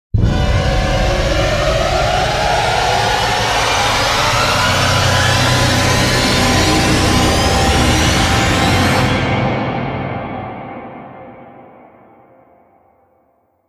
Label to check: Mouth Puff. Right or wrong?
Wrong